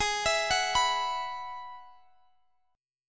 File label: Ab Minor 7th Sharp 5th Ab, Guitar Half Step Down